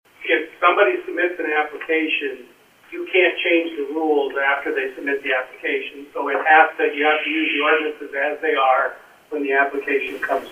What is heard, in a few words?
wind stop